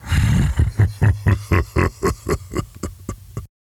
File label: Laugh; Raum